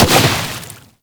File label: Bubblegun Pistol Hit Explosion